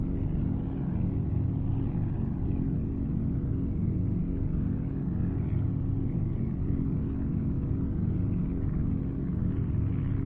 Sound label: drone engine rave effect; bgm